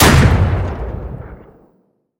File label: Automatic M242 Single, Weapons; DCS